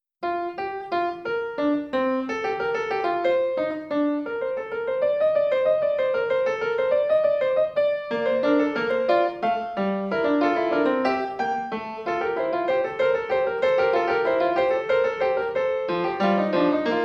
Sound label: bach fugue, piano; fugue 21 bk1 wtc